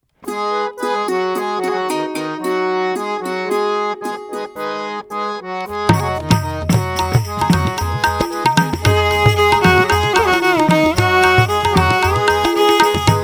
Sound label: Gents Karaoke G Sharp Pancham